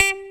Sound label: CLAVIBASS; Pro-Rec - Synthsonic